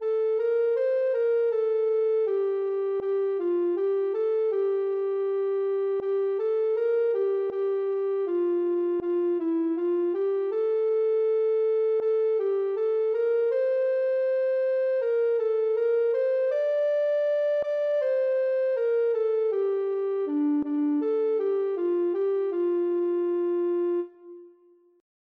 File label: ken, ocarina